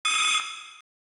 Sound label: tracking beep